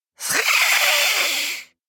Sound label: Hisses Dry, Creatures